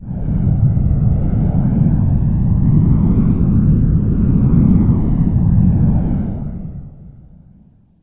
wind

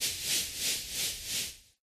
motion; animal

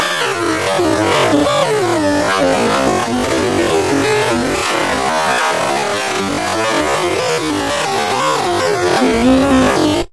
computer; operation

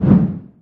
swing; operation

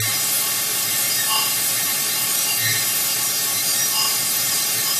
fly a spaceship

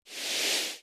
drag the cloth; operation

